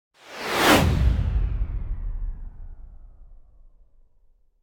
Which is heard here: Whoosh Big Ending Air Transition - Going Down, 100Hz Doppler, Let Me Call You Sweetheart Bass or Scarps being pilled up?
Whoosh Big Ending Air Transition - Going Down